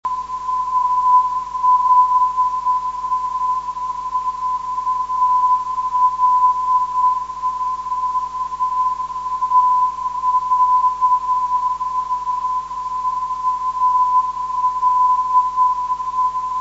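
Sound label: 384 kHz, non-directional beacon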